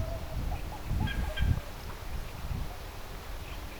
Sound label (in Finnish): jalleen liejukanan kaksoisaani pitkan rannan lintutornista aanitetty